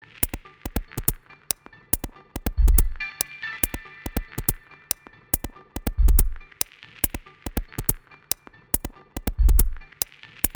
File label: Strange Ambiance